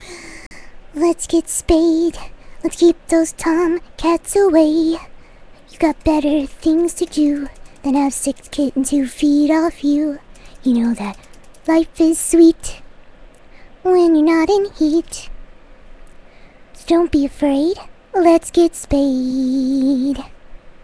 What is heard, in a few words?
Singing; Lets Get Spayed!; Fluttershy